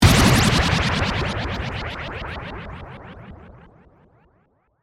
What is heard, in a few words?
Hit Sweeper